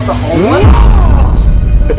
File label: nnnaaaaahhh